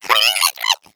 egg bot hot laser; Portal 2

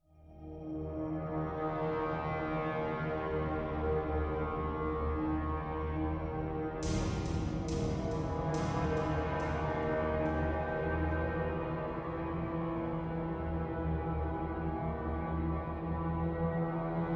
rooftop crab hallway, music; Half Life Alyx; hotel

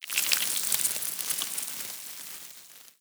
swarm large, cockroaches; critters

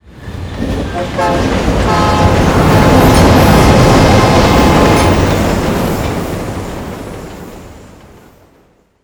train overpass; ambient; machines; Counter Strike Model Pack; CS:GO

